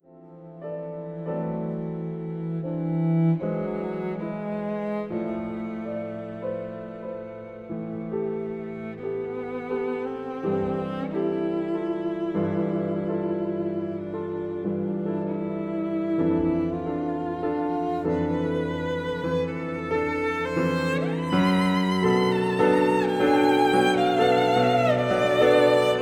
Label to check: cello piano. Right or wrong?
Right